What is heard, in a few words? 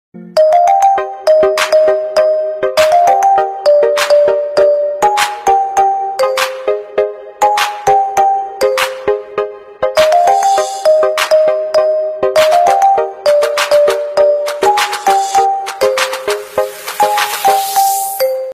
instrumental music ringtone; anmol music